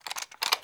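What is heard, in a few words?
weapon foley winchester reload lift; Call of Duty Modern Warfare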